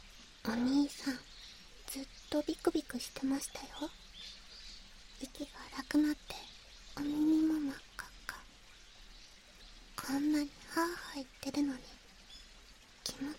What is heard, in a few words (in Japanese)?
左 耳ふー, ASMR